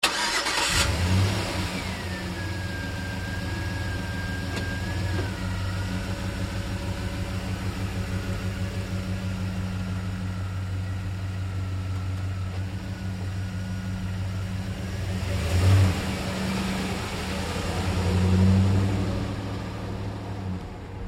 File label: Truck GMC Savana Start Away Slow; Vehicles